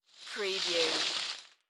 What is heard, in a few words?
Skiing, Snowboarding, Coming to a stop, distant